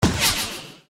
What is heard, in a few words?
Football slide open; Nokia; Audio themes